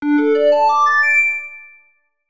Tyrannosaurus Alert Here, phonetones, Sony Ericsson; G900; PlusFourSix; Lars Edvall